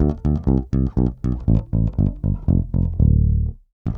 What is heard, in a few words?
bass loop; ACID MUSIC